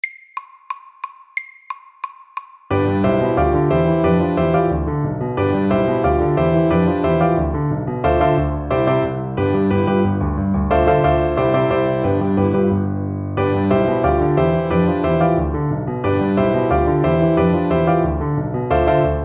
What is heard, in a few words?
Honky tonk FORT